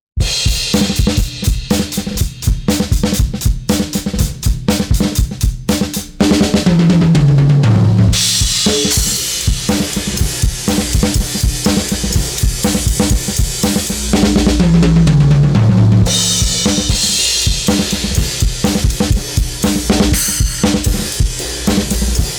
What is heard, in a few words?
drums Smasher